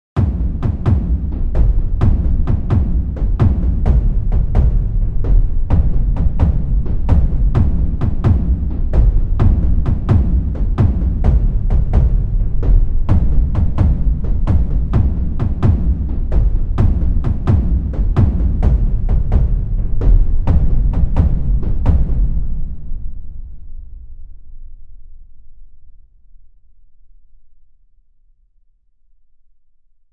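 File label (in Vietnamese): tiếng trống xung kích trận đánh kiểu trung quốc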